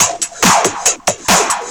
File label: Breakbeat; Vengeance Samples; VEC2 Loops